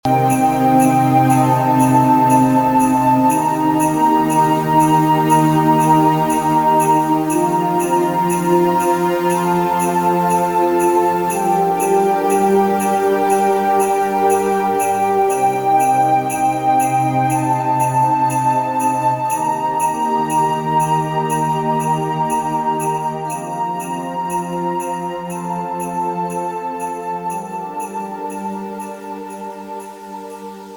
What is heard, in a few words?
instrumental wind; Lyria 3